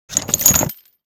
Chain drop metallic impact hard

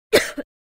Teenage girl cough

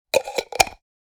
Glass medicine jar lid closing

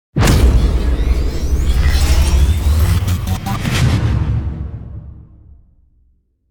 Time warp slow motion portal; energy

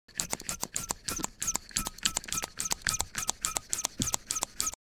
Blowing up a rubber balloon air pump

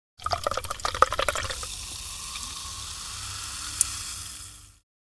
Champagne pouring into crystal glass fizz

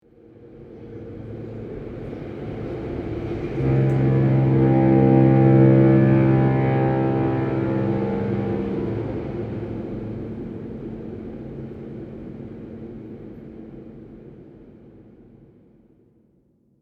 Ship passing by fog horn distant perspective

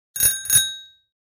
Metal bicycle bell single ring; vehicle

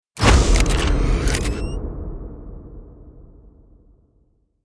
Cinematic camera flash shot

